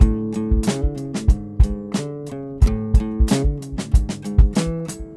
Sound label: short loop